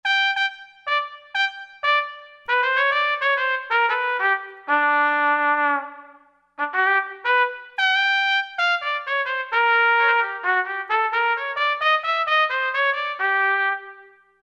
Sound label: Penny Tpt; Roland SRX-03 Studio SRX